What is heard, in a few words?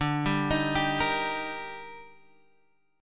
D sharp Major D, chord; D#/D arpeggiated; JGuitar